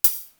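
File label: hi-hat; East West; Global Sounds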